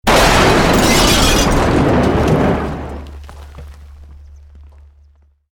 Building Collapse Medium; Created dramatic effects of buildings collapsing or breaking; Construction

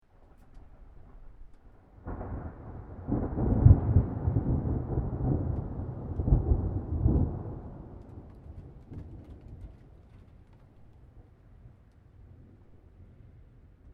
Thunder Lightning Storm Medium Distance; Weather; Thunder roll, thunder storm at medium distance.; Thunder Sound Effects

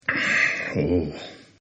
Sigh Oh; Exhaling; Voice; Partners In Rhyme

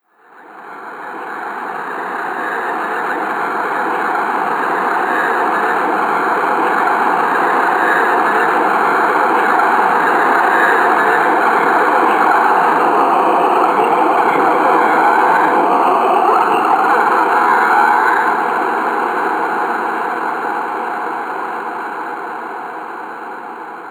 Cavern Chaos, scary soundscape; Fantasy Sound Effect

